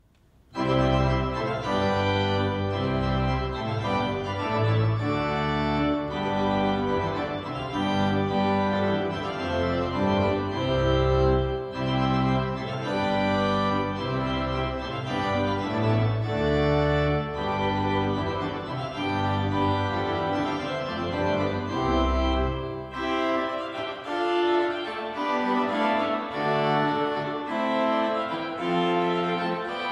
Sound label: Viscount Envoy 35F, organs; Praetorius; Fanfare; Ballet des Matelotz